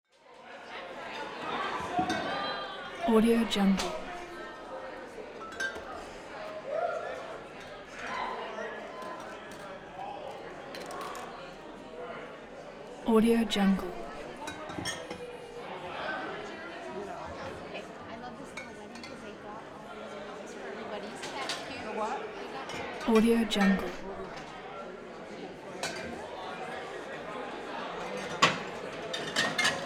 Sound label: crowd reactions ballroom large wedding